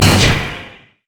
dark binding; LoL; morgana; fallenangel

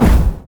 mage chains; LoL; ironforge xerath

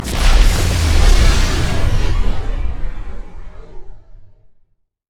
hurtbeam; odin

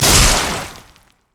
basic attack; LoL; nami

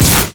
execute; LoL; darius norseking

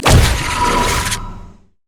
gate start; LoL; twistedfate

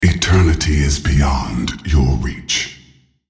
attack; Nasus; Character Voices - English

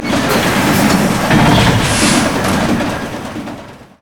prop claw animated; odin

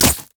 two shivs; jester

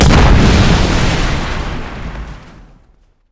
infernobomb; LoL; ziggs snowday

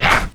ravenous basic attack; LoL; yorick

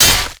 basic attack; masteryi base

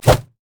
poppy basicattack oc

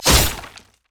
basic attack claw; rengar

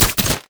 minefield land; LoL; ziggs madscientist

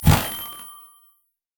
sow the wind cast marker; LoL; janna

